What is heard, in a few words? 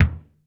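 kick, 60s kits; 90 sSampleCDs; Crypton - DrumBase5000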